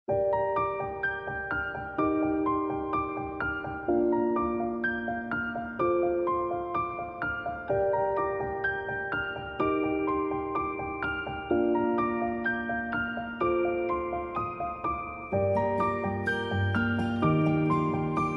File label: background music